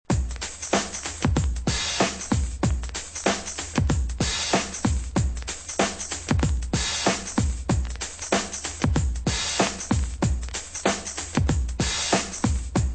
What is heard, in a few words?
drum loops